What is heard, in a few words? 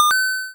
pickup